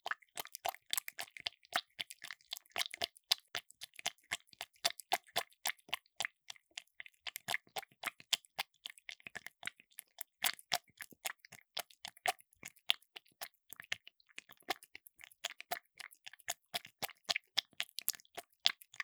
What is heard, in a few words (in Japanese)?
クチュ音 ノーマル～高速